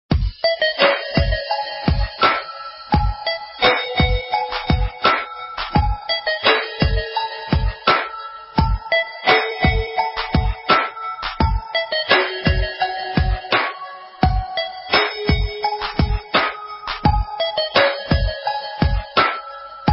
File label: latest slow beat ringtone